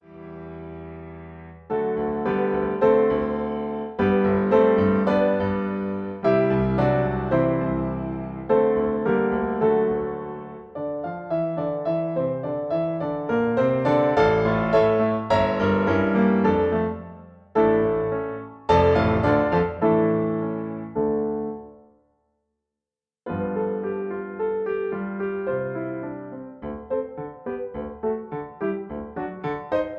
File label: Accompaniment G